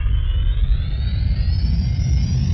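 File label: engine accel xwing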